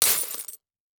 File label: Impact Glass Debris Hit By Small Metal Pole; Impacts